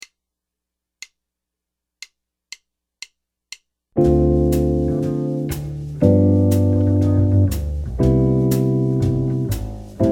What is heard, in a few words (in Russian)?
Dzhazovaya posledovatelnost akkordov